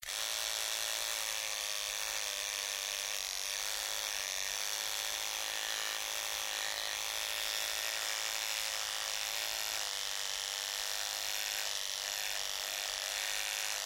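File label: hair clipper sounds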